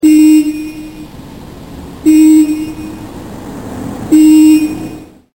bus horn

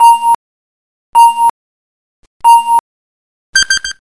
timer

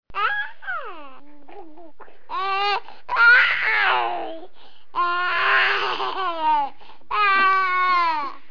Baby Cooing

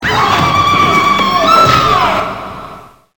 echo cry, Crying Baby

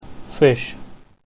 Fish

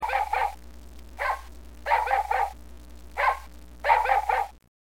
ruff ruff

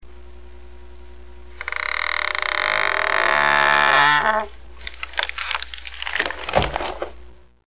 TREE FALL

